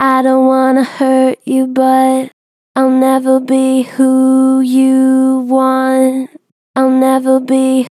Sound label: Gen Vib I dont wanna hurt you, Vocals, Natural Vibrato Gentle; Harmor Vocals